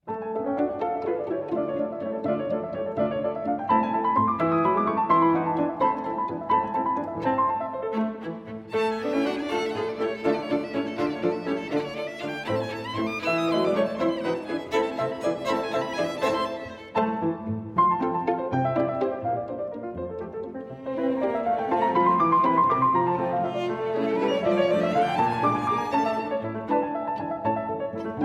Piano Quintet in G Minor, Scherzo - Allegretto Vivo, Sempre Molto Leggiero